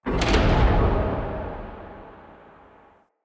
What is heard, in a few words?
Wall Gate Stop A; Movers